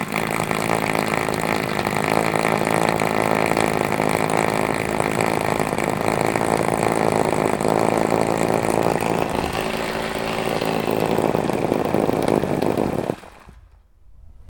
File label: power drill machine close up drilling outdoor